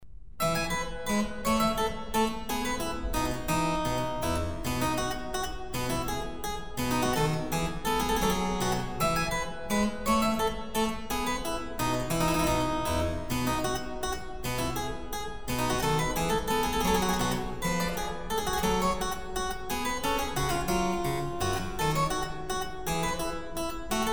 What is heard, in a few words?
Minuet in F KV2; Mozart